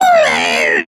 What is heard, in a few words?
Angler Death